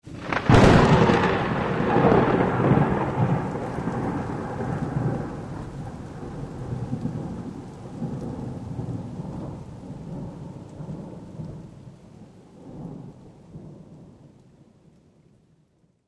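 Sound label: zvuki molnii, lightning sounds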